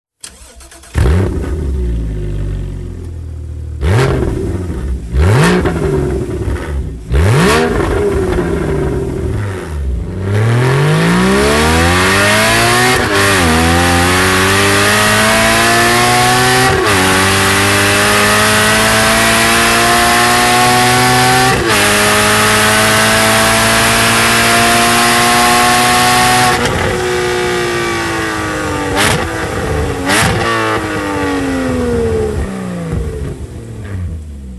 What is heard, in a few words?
Slip On Exhaust